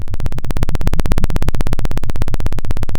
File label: Sound of a plane taking off